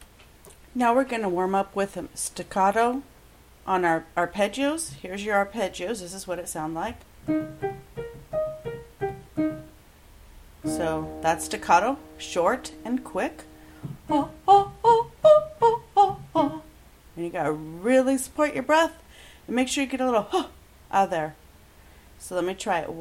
warmup stacatto arpeggio Bb

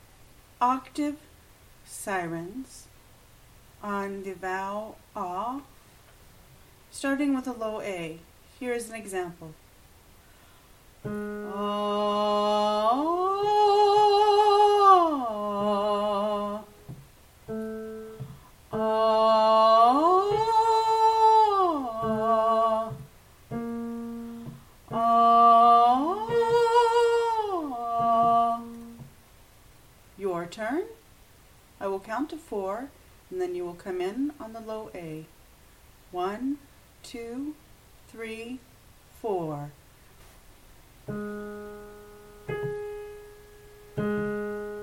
ah siren, warmups

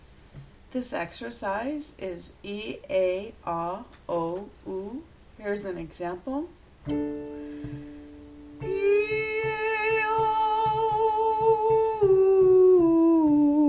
warmup EE AA AH OH OO

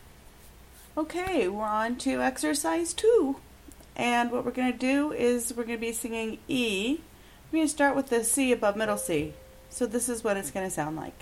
warmup EE ee EE t 5 tone from top

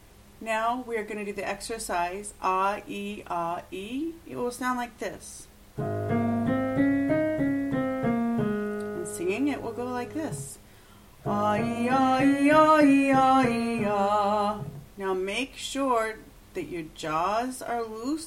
Ah EE Ah, Warmups

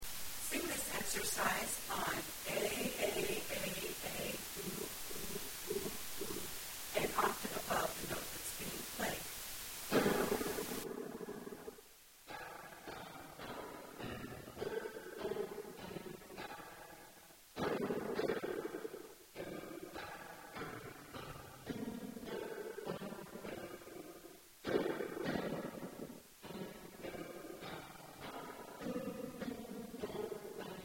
vocal aaa ou ou, warmup; Vocal Warmups

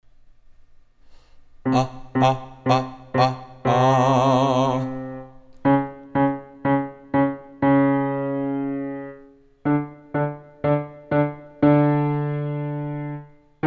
flexibility, male warmups

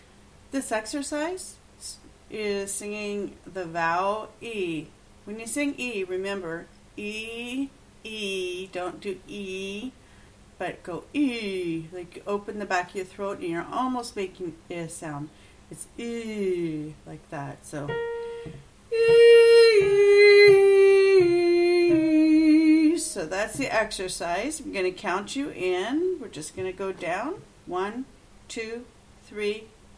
EE 5 tone going down, warmups

